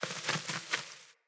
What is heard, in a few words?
Sparrow Four Wing Flaps; Animals